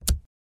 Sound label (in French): clic